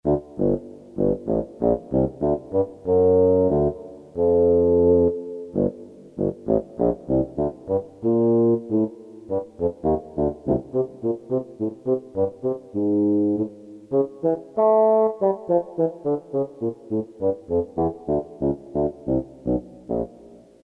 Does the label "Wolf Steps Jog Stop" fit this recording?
No